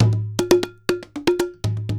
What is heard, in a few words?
DRM